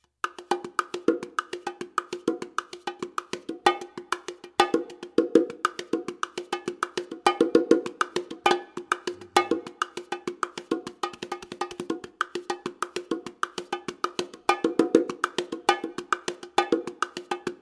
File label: Bongoes